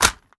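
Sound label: assault rifle reload empty fast mag in; gmod; viper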